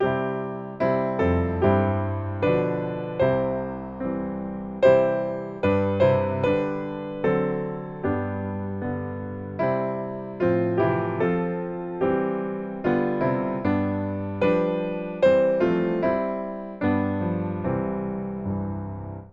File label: piano